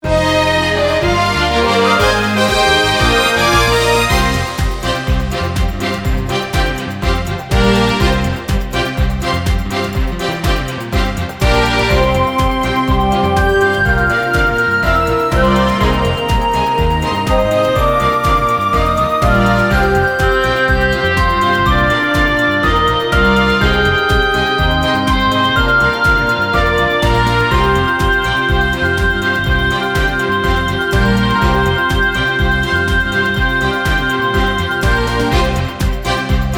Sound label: Victory Fanfare; students of round